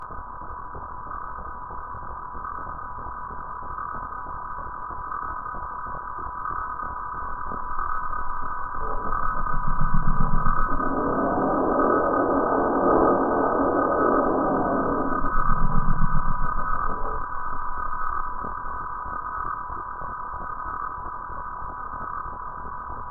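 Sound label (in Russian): хоррор. звуки становятся все ближе; poka vi ne uslishite ih vsegda v svoej golove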